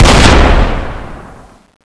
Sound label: spas12; spas12excraft; cstrike